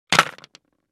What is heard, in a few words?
Drop box of chalks on concrete; Chalk and Chalkboard